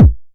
909 BD; Goldbaby Tape808 & Tape909; The Tape 909

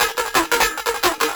Bongo 175, Perc Loops, Drum&Bass; EZRollers

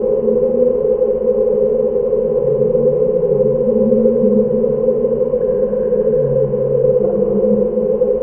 SPACE DOCK; Spectrasonic Distorted Reality 1

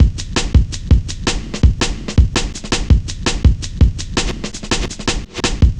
Cut Thru B, Drum Loops, Jungle, 165.5

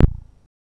Perc, Rattlesnake Parametric Percussion System; BME Rattlesnake Parametric-Percussion-System

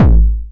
909 BD Tape Sat

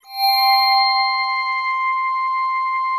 ADDITIVE C5; Greytsounds; Scott Peers Sound Engineering

